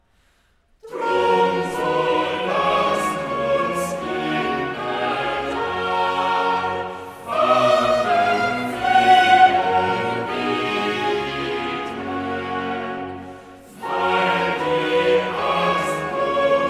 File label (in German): Cantata, Choral; BWV 115 'Mache dich, mein Geist, bereit'; VI. Choral 'Drum so lasst uns immerdar'; Bach; Tooting; 22nd Sun After Trinity